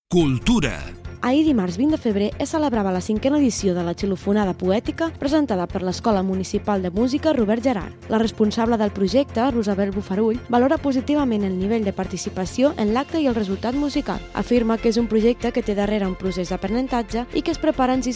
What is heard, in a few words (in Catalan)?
Xilofonada